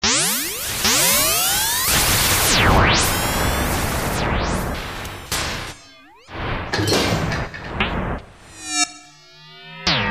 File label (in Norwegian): stykke krasjbang